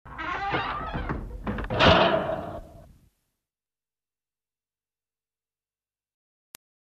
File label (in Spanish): puerta